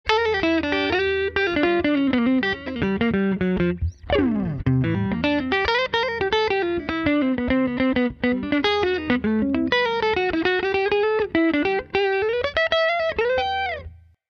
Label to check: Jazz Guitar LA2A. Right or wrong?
Right